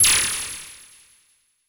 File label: CABL TEN, CABL TENS; Total Control - Action Cutz